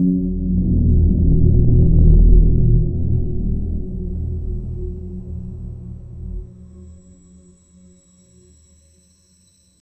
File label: a low sharp drone that, apocalyptic